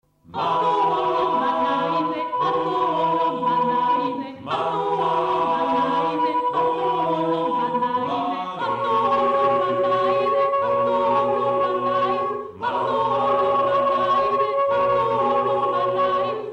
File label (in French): Chant; Thyeste